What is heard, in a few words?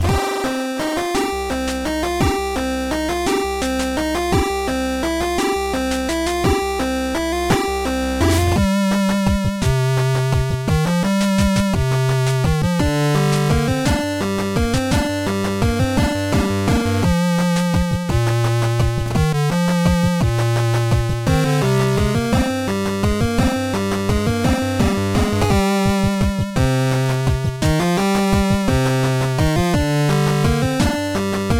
8Bit; GoT